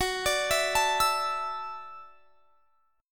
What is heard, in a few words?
Gb Minor 7th Sharp 5th; Gbm7#5; arpeggiated; x,21,24,21,22,24